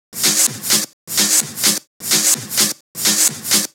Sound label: House Loop, 128 BPM; Vengeance Samples